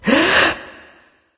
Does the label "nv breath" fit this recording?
Yes